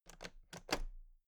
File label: toolbox latches open heavy